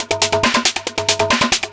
drm 138, Techno, drum-loops; Techno ID; franchise-loop

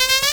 Synths Root C

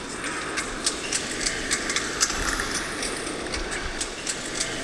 HARBOR; East West; Global Sounds

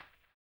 HAMBONE; ILIO; Vocal Planet; Blues & Soul

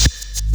reverse; PlatinumPhattCD1; E-MU Producer Series Vol. 8 – Platinum Phatt; Phatt Combo

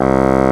bassoon; Roland L-CDX-03 Disk 1; WND Bassoons

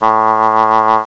BASSOON, REEDS; CMI Sound Library Rev 1.3

